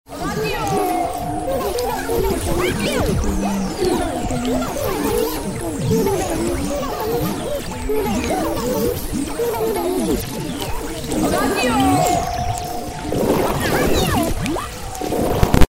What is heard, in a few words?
jingle; PLANETALDOL